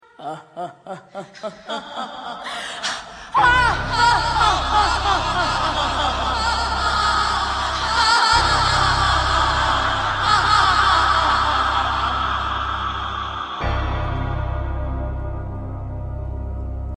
zloveschiy smeh

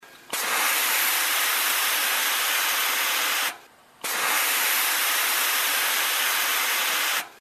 zvuk para

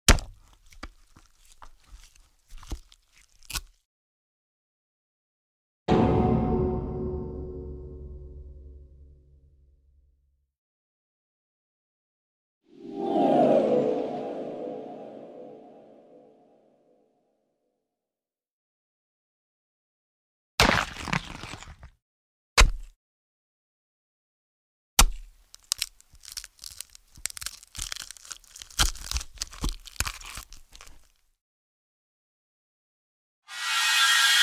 strashnye zvuki

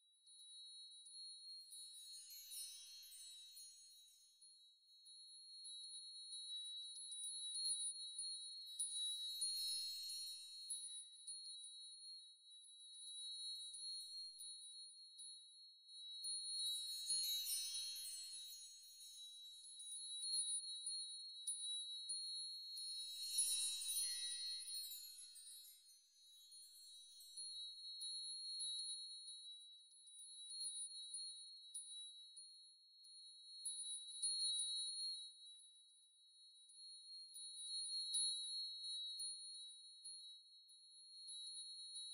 effect siianiya zvezd

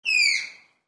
tapir, zvuk tapira

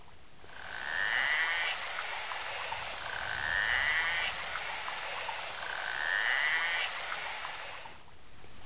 narval, zvuk narvala

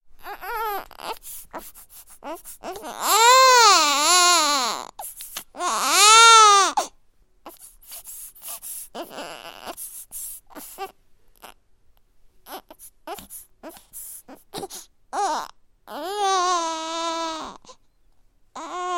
Mladenec novorojdennyi plachet bolit jyvot

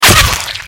попадание в голову; Сs go попадание в голову

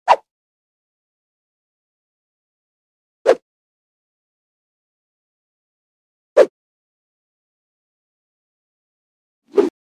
vzmah palkoi rukoy neskolko variantov

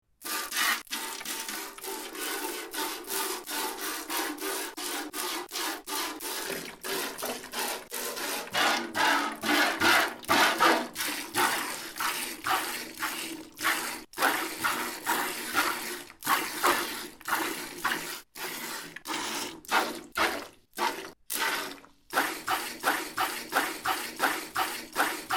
doika korovy

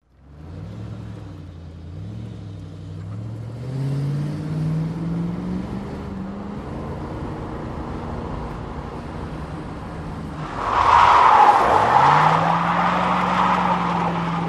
Zvuk zanosa avto vizg shyn

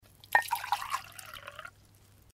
nalili vodu v stakan

